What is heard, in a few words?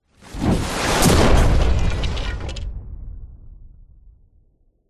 explosion large with whoosh metal glass wood